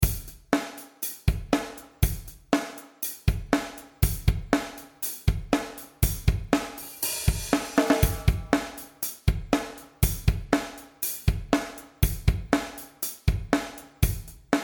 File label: Em 120 funky groove